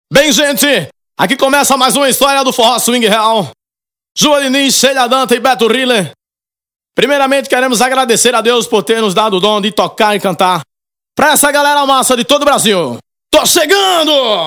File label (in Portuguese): abertura